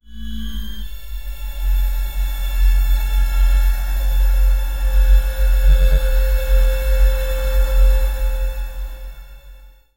cinematic energy

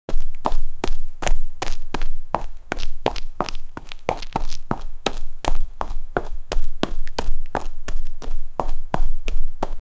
make someone walking fast

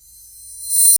tiny yellow sparks

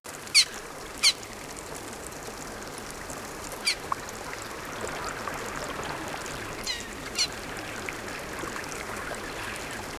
elephant trumpet gentle

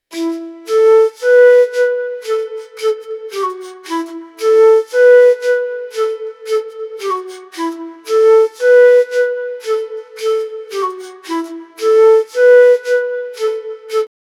gentle flute tune; ethereal

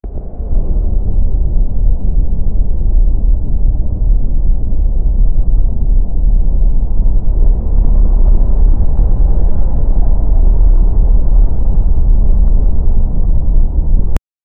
ship sound; watercraft

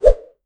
quick whoosh; motion; 1 sec

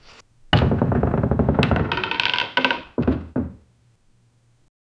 realistic foley, room tone

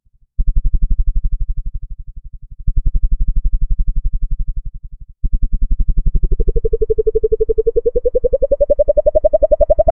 cartoon style jump in air

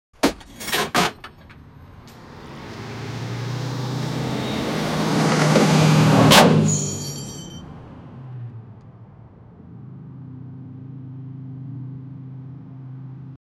a car is crashing

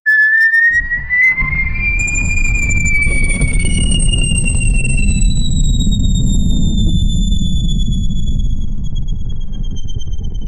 a cartoonish sound effect, rocket

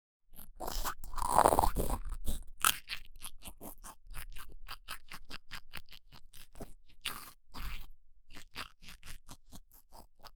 the sound of a gnat, insects

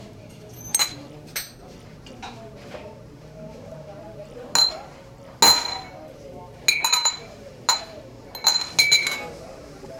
elegant 1900 dining car a

